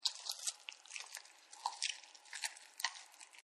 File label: Bone Crunch, Dogs; Animals